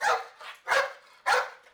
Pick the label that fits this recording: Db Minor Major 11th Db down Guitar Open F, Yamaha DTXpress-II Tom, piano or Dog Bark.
Dog Bark